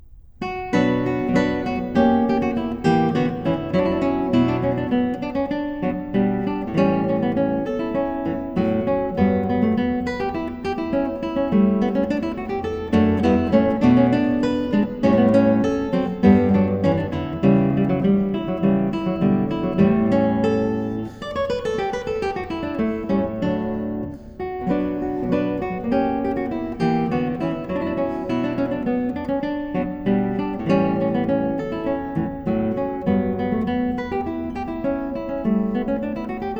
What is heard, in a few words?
Partita for solo violin in B minor, Tempo di Bourree; BWV 1002; Bach; The Six Sonatas and Partitas